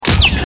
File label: Laser